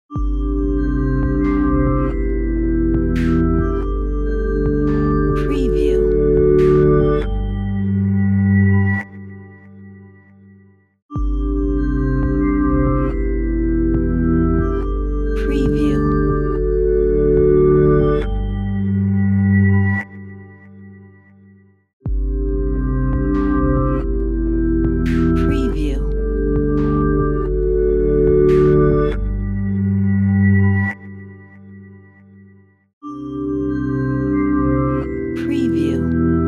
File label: company logo